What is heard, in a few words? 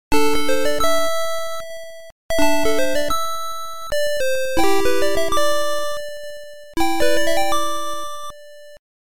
Dolphin, tandy; KQ4